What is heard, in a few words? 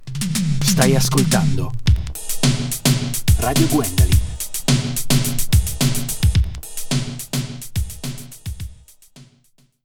Jingle; Stai ascoltando Radio Gwen